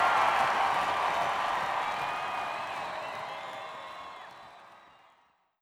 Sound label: Audience Applause (Live)